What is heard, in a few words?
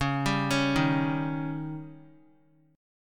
Db Suspended 2nd Flat 5th, Guitar; arpeggiated; JGuitar